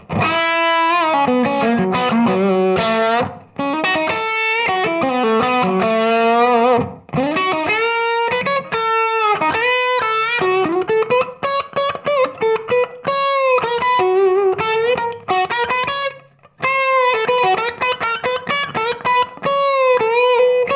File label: Semi dirty